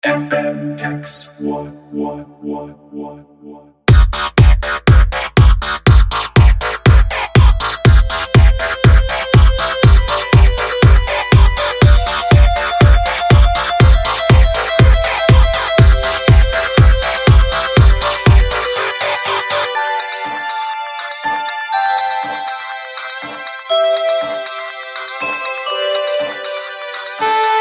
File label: electro suite